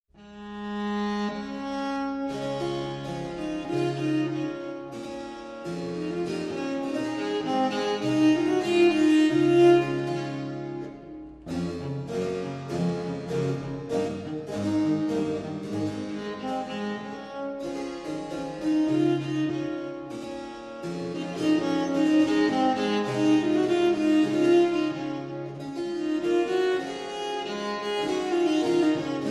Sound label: pipa